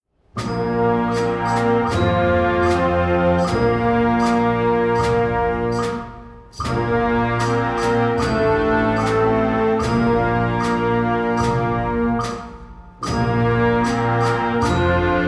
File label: Exercise Scale Builder